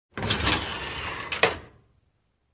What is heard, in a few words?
Bright sliding door open